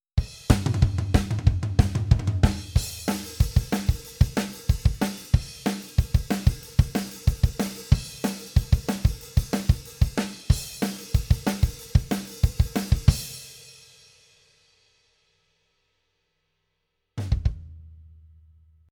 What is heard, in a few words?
Drum dry